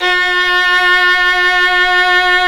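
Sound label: solo violin, Synclavier Strings; ILIO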